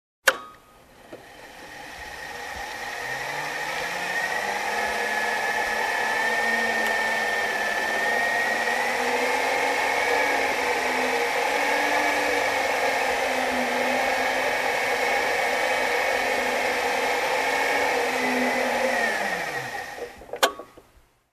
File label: Handmixer running slowly